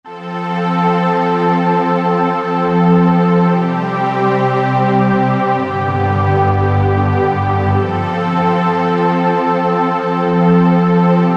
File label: Underscore PAD; Worship Flow